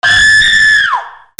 PEOPLE

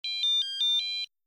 PHONES

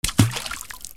WATER

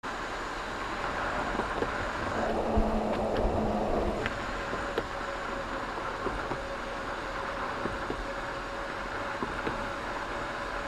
CARS

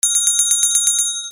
BELLS

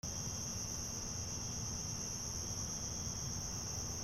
ANIMALS

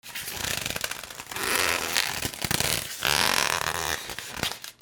FRICTION

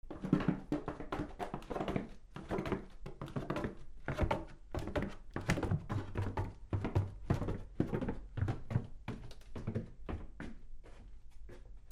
FOOTFALL

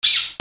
BIRDS

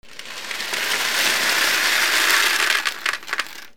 MONEY

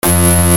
BUZZERS

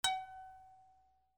MUSICAL

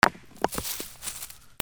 FALLING

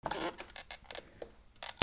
SQUEAKS

